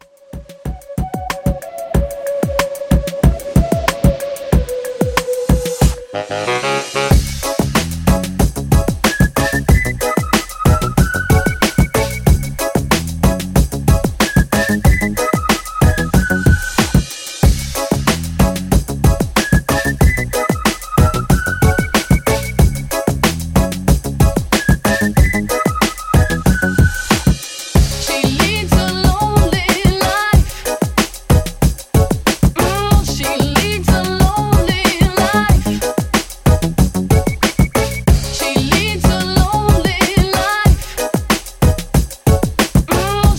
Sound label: 90s Extended Redrum 93Bpm